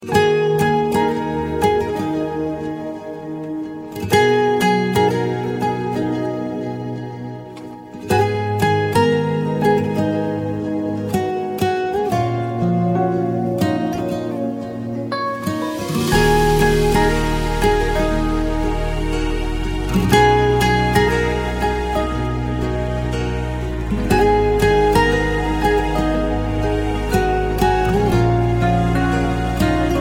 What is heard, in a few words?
Ringtone